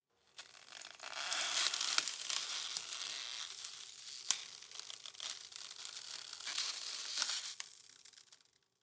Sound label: Crunchy ice pressure and cracks